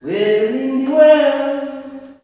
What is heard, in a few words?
wey windi, male; Spectrasonics; Heart of Africa 2; S.MALEAMB